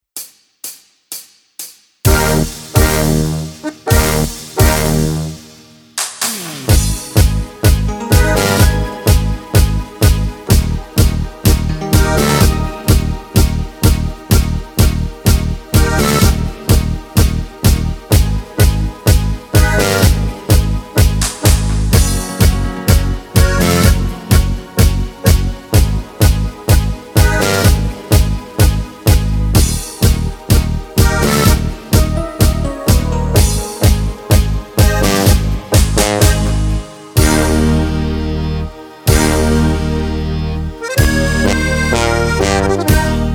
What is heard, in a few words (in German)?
Karaoke ohne Chor